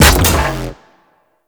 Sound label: Detron Shot, Weapons; Warframe; Void Trader